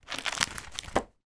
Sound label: household small photo album page turn